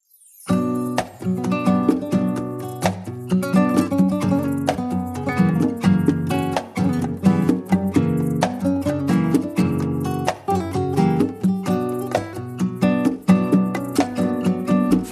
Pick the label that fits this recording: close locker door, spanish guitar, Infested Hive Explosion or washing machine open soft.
spanish guitar